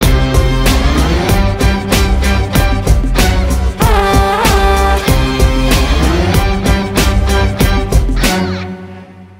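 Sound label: Theme background music Ringtone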